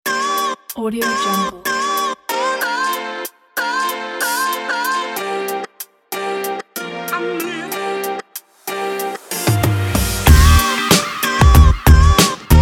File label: hiphop upbeat energetic